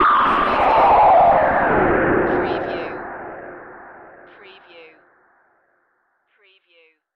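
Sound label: Production Element Distortion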